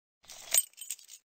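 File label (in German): Schlüssel finden Sound; The Dream Machine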